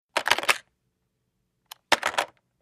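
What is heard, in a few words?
Telephone Pick Up Hang Up Light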